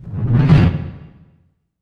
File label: IMP FLAME, Cinematic Impact